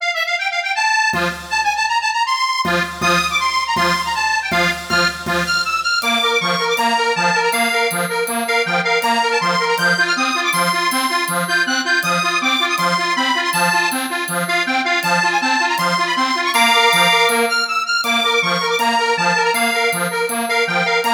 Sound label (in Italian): Scherzo Fisa Bassi Percus